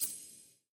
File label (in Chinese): 上锁; 球员技能; nba